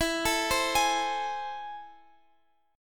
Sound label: A Minor Major 7th, E